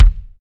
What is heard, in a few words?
natural kick e key, kickdrum sound tuned to the E note. Loudest frequency: 224Hz; kick drum samples